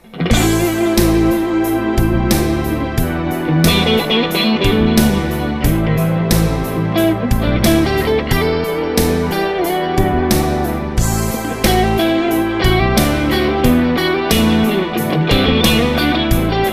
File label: CLEAN drive, amps